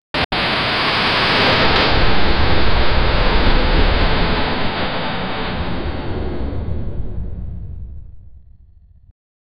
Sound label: a harsh downward, abrupt